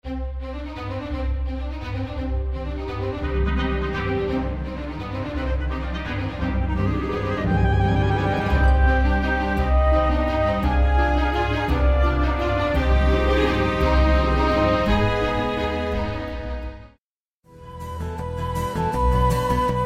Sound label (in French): harpe exemple